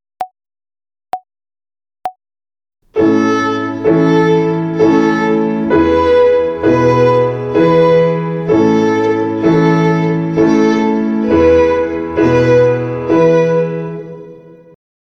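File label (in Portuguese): Ginástica para o dedo 1 Corda LÁ Ternária, Violino; Violino para os Pequeninos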